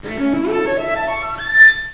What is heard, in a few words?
Arpeggio, cello